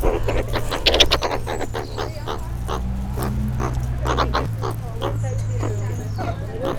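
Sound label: Suricata suricata aggression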